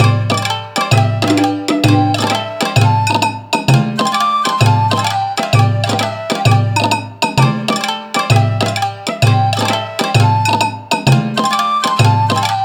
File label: Bgm Taiko; Kotoba no Puzzle Mojipittan Encore